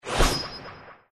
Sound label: penguin hit success